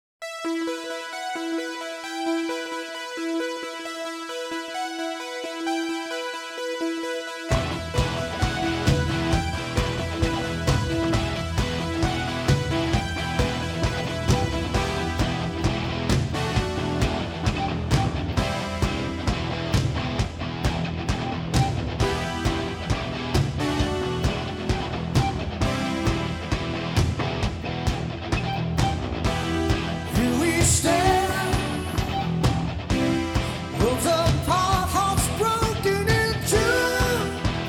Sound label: Jupiter8